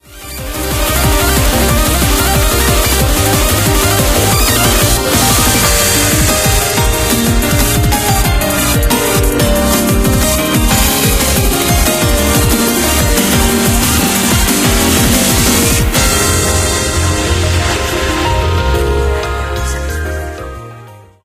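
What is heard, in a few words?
background music crossfade; Invaxion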